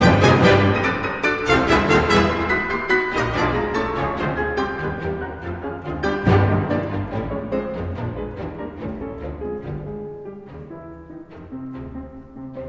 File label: Piano Concerto in c; Sergei Rachmaninoff; Piano Concerto #2; Op.18